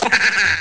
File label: hahaha; ST-XX Amiga Soundtracker Sample Packs